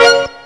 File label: HIT, Hits & Brass